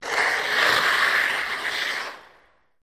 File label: Ice Skating Swerves